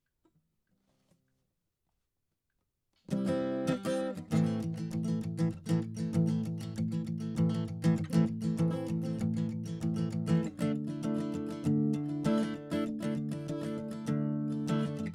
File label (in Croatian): SM57 tijelo; Reaper project